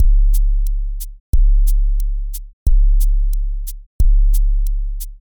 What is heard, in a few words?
drums 808 open